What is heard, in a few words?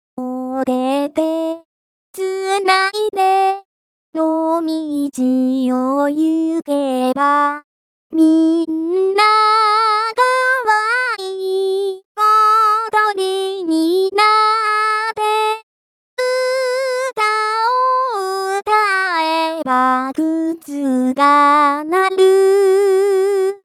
vocal only; traditional